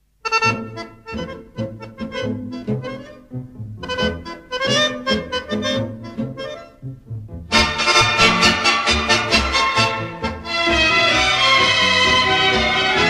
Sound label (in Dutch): Accordeon tango